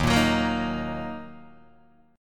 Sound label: Eb Minor, Guitar, Open D